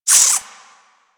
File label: Exterior Forest Critter; Warframe; Ambience; Duviri